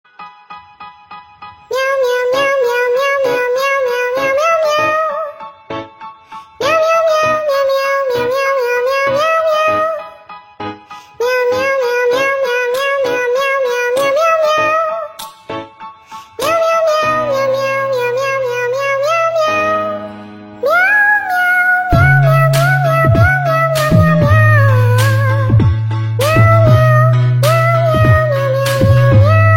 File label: Bongo Cat Music Meme Song